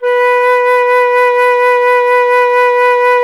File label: FLT FLTB, Flute; Miroslav Vitous - Solo Instruments VOL-2